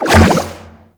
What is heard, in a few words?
Aqua Board Spin; Warframe; Yareli